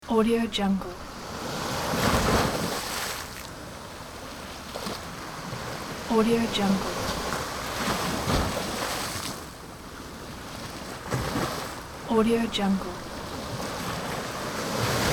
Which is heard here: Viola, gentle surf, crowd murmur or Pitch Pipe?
gentle surf